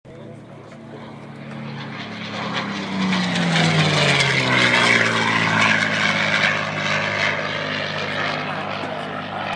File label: P51 sound